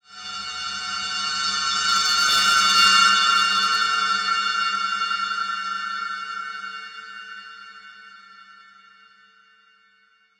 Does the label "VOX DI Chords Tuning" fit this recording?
No